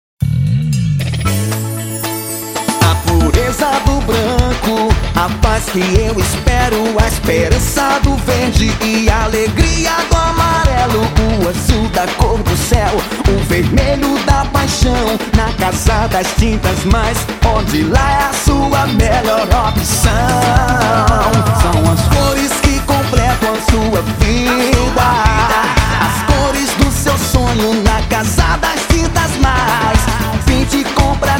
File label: jingle; kazam studio; casa das tintas mais